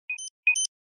Battery caution, ui; Galaxy S9

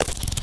Pen Drum, notifications; Galaxy Note 9

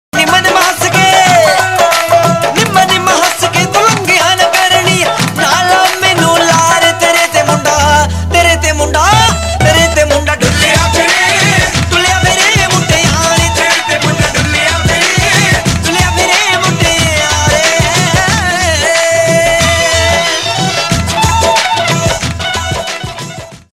Punjabi Remix Tones; Punjabi Remix Ringtones

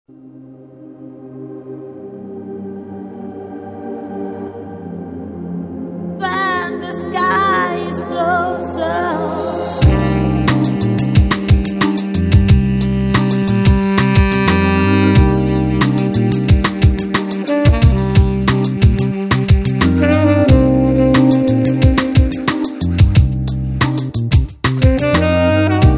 Ambient Chillout Ringtones

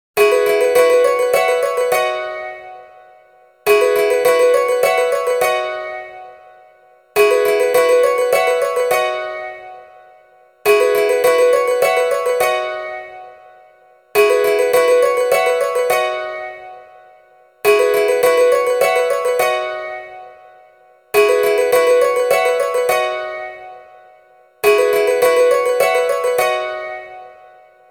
Dulcimer, Alarm Tunes

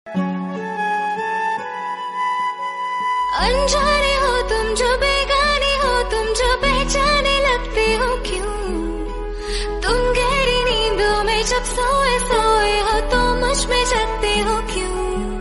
Romantic Ringtone

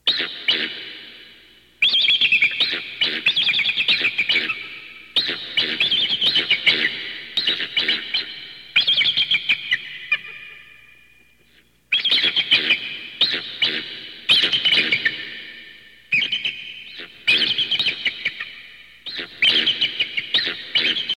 Birds, 3D Effect sounds

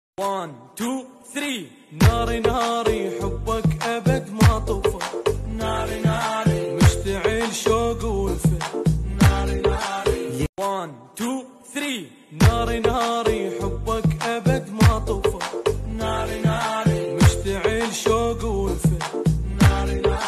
Arabic Tik Tok Ringtone

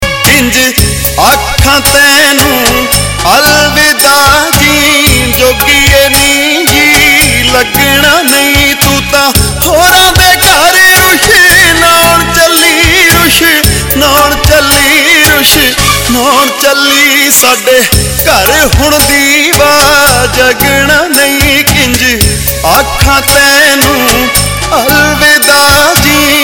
Punjabi Sad Ringtone

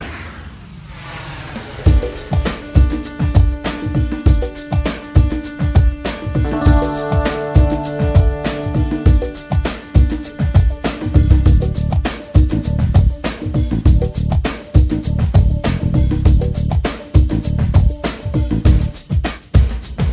Acoustic Guitar, Ringtones

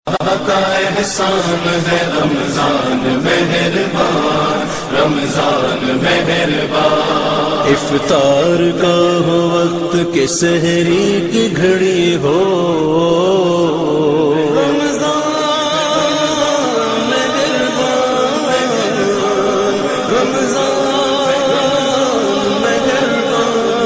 Islamic Ringtones

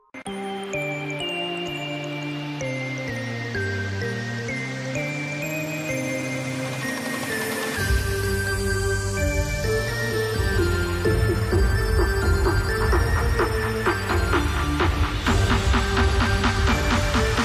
Beats Ringtone; Airtel; Android

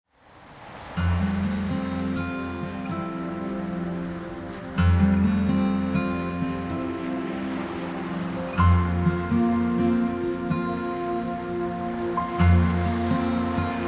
Sea breeze, Ringtones; Samsung Galaxy S3

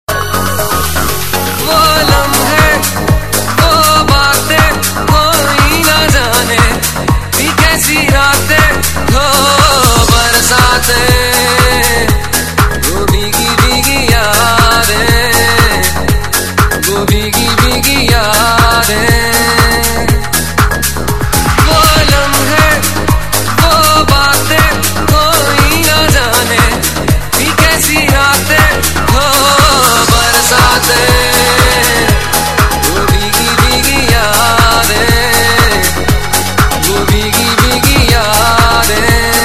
Hindi Sad Ringtone; Lamhe; DJ mix; Hindi Movie

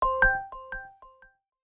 notification sound, notifications; OnePlus 6T McLaren Edition Ringtones

